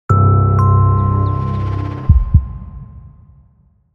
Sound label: SOUNDLOGO; POHL-IMMOBILIEN